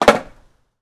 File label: land